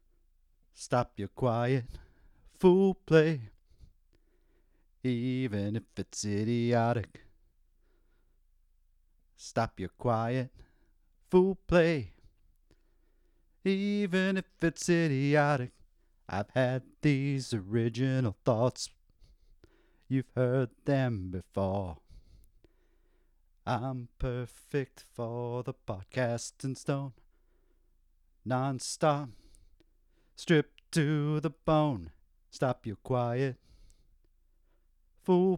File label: vocal track